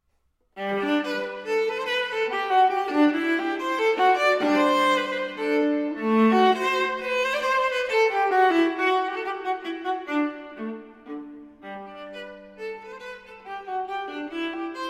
Cello Suite No. 1 in G Major, for Viola, Menuets I & II; J.S. Bach Cello Suite No. 1 in G Major, BWV 1007 (Transcr. for Viola) V. Menuets I & II